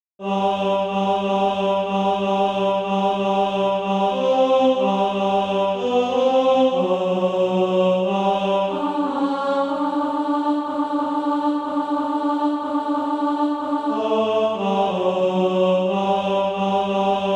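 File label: tenor, SATB Practise Music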